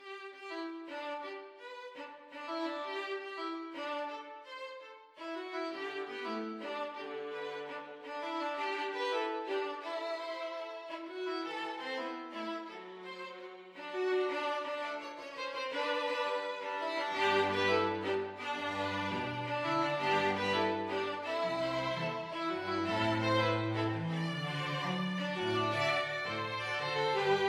jingle bells salsa, string quartet

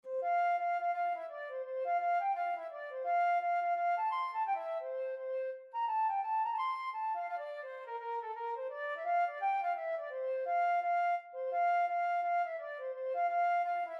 flute

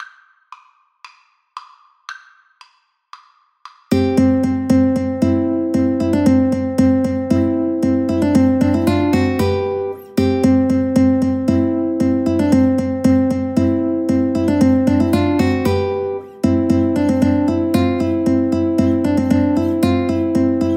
Ukulele Trio

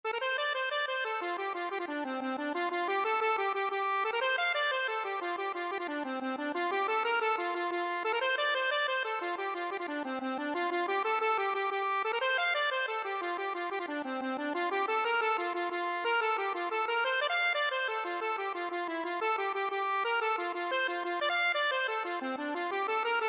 accordion; o carrol

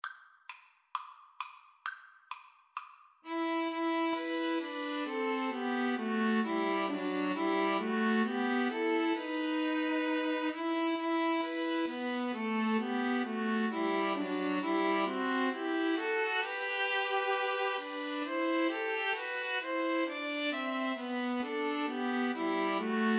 god rest, string trio

